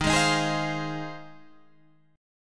F Minor 7th D sharp

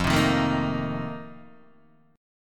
F 11th, Guitar, DADGAD

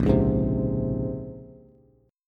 Bb Minor 9th Bb, Bass; Bbm9; strummed; JGuitar

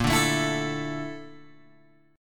Bb Major Sharp 11th, Guitar, Open G

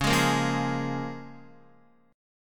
C sharp 6th C sharp down Guitar; C#6; strummed; tuning=4E,4A,5D,5G,5C,6F

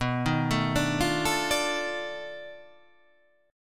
B 7th Sharp 9th Flat 5th B down

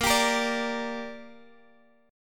Bb 6th Bb, Guitar Drop D

